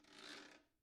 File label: guiro long; Idiophones; Struck Idiophones